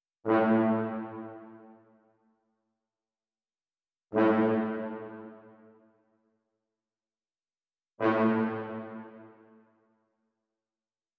124 BPM A Min Bass; Cymatics; Destiny Gold Expansion; Dior

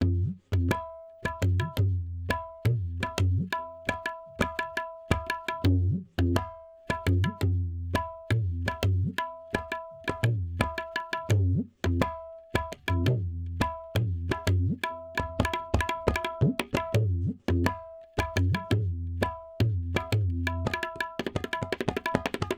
tabla 085; Hip Hop India Modern Percussion

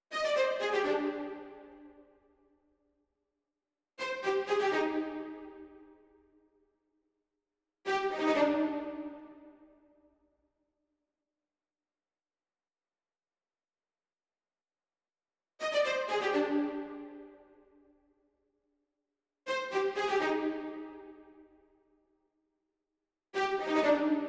124 BPM C Min String High; Cymatics; Rari; Destiny Gold Expansion

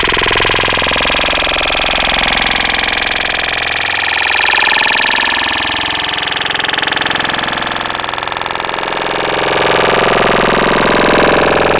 Scissors, Growl Tables; Cymatics; Growl Tables Vol 2; Serum

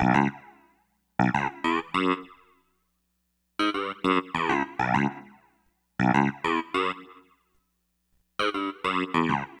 Bass, 100 G

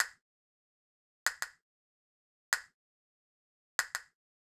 Castanets; Hip Hop Exotica; Big Fish Audio

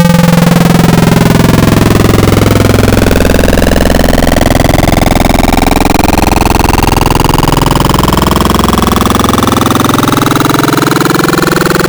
Square Formants, wavetables; Cymatics; Wavetables Collection Vol 3; Serum

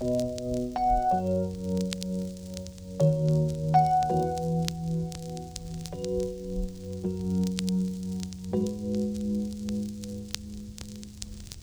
Full Chords, 81 bpm; StudioLinkedVST Vinyl Touch; Kontakt; hiphop; Progression 14

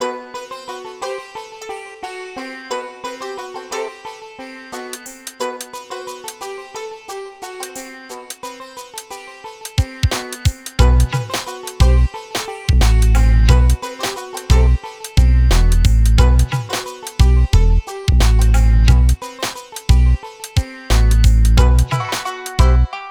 089 Bmin, Hip Hop Exotica

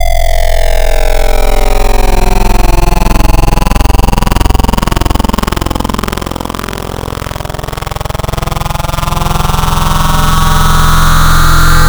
Spectral Inv, Wavetables; Cymatics; Wavetables Collection Vol 3; Serum

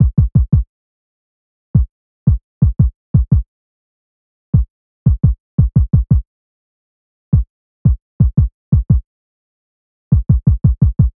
Kick, 086 Emin; big fish audio; Hip Hop Exotica; Apple Loops; Drum Tracks

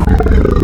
Pig, Animals Wavetables

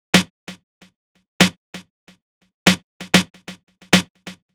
Snare, 095 Ebmin, Drum Tracks; Hip Hop Exotica; Apple Loops

